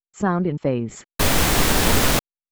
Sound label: phase